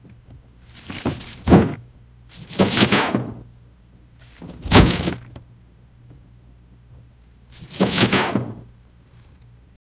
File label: heavy thick book slamming